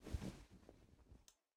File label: Sit Bed; MiSide